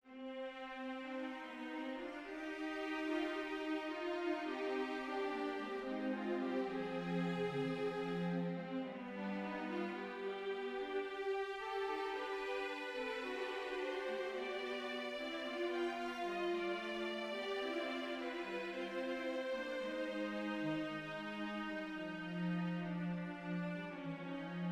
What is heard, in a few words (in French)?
canon 4 cordes; Jean Sébastien Bach